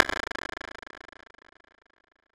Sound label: no diffusion; understanding reverb; anderton